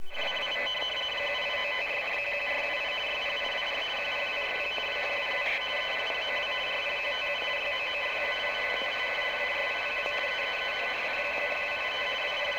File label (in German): RTTY ohne Preselektor